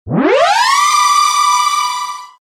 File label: sweep up energetic